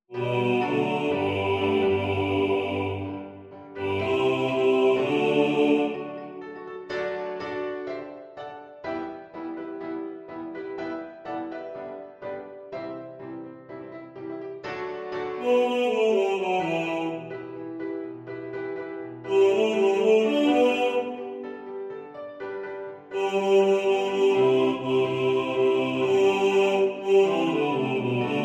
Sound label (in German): Bass 2 im Vordergrund mit Klavier, Männerchor